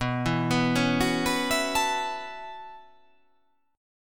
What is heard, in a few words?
B 9th Suspended 4th B down